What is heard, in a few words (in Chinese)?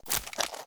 嘎枝脚步声